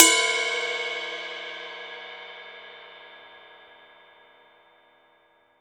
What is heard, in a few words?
RIDE, Drum Dry; East Collexion; Drum 1 Dry